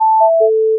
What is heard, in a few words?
Call End